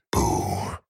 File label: boo